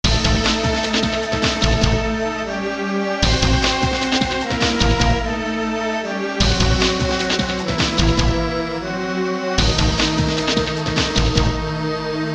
SILENT BEAT; Lux Pain

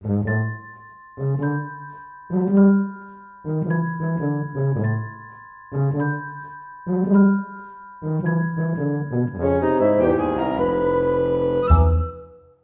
Margesamba Main

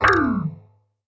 Shocked

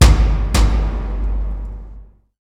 Music Drums For Sniper Ambush; Battlefield 3

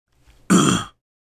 Grunt

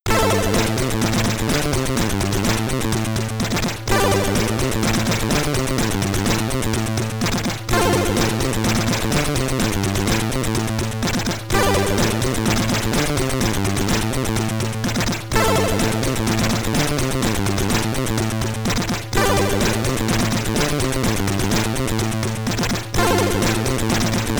Main BGM SID Stereo, commodore 64

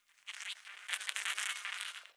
Insects; we are the dwarves gamerip 2016

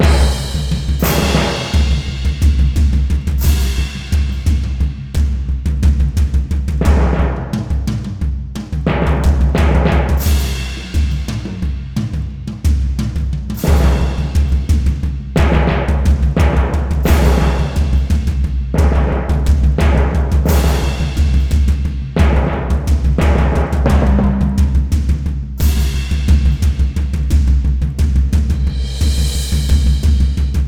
Music Battle Perc; MushroomBoss

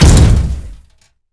crate impact rock

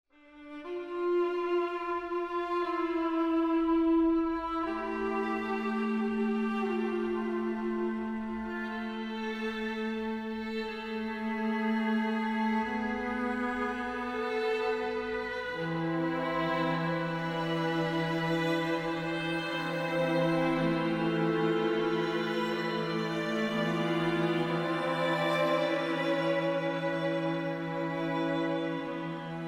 Remember Romantic; dear esther

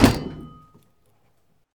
Hit Baseball Bat Light Metal

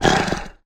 alerted, Wolf

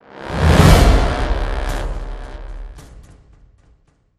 BRASS SWELL

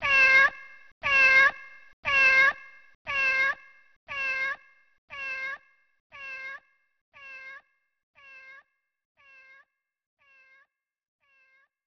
Continue; Shogun Warriors arcade gamerip 1992

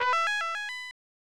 Item Chime

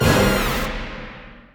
Combo Stab

